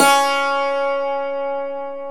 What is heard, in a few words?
Hammer Dulcimer C#2; Planet Earth; Digital Sound Factory Planet Earth